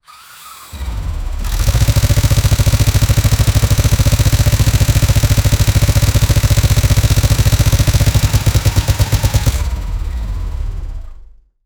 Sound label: v12 or v8 motor slow; automotive